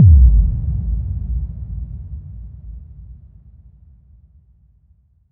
FX Impact Simple

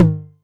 Tom Hi, Novation Drumstation 909

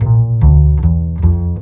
BASS, Drum & Bass, 150 BPM; Ueberschall; Drum & Bass Flash Trackz

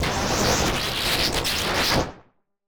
Casio, Glitched, malfunctioning synth

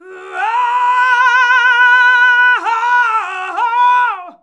"UAAHAUAO", FEMALES; Best Service; Hyper Dance

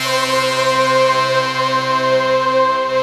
rough stack; Optical Media International; Sonic Images Library

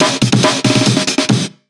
Fills, Loops 140 BPM

